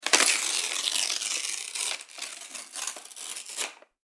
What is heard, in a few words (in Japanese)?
紙をちぎる